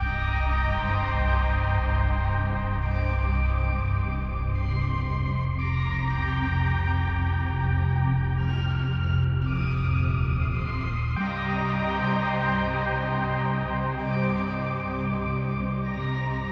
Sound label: 86 BPM Cm, RnB loops; sad love